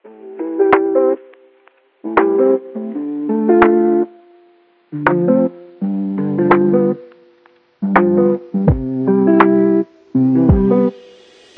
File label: Evocative, Modern lo-fi and retro background music